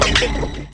down test

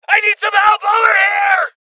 Injury Male; Voices Injury Male

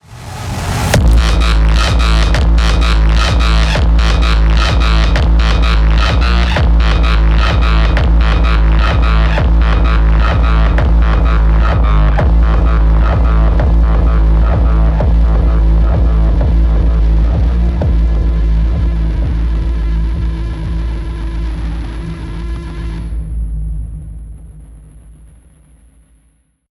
PVP Sudden Death

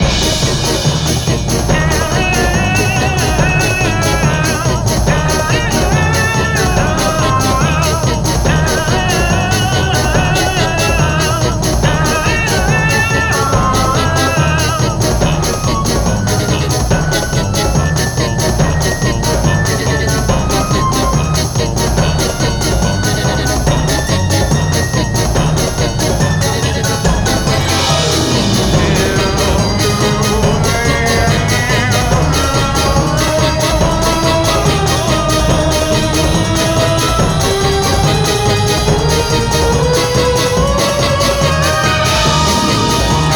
Music Race Loop

